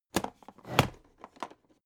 alu case small close

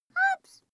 child play jump

